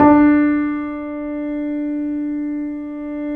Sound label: KEY, YC7 Piano pp